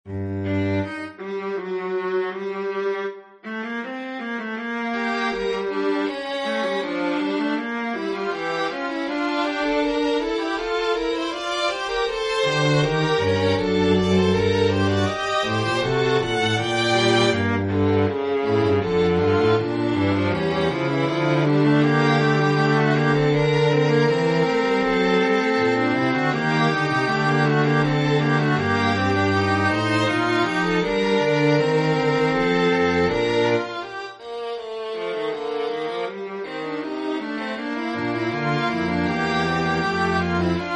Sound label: Fugue in G minor; Bach; computer generated from our quartet scores